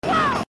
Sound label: crash